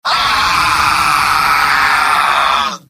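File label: scout pain critical death; mvm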